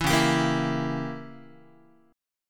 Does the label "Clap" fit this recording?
No